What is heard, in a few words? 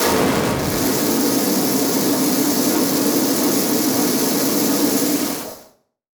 Transition Blast Distortion; Adobe; Production Element